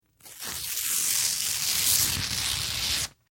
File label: Paper Rip Foley Tear; Materials